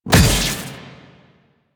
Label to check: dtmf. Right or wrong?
Wrong